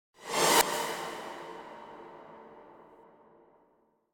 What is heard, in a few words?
Whoosh Air Impact - Short Thin; Hollywood Edge; sound designer's toolkit v2